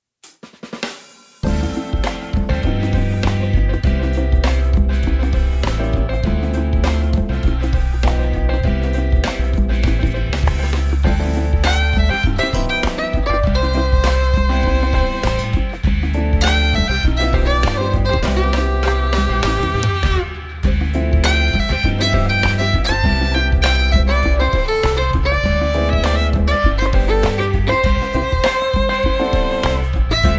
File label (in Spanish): violin con pistas moderno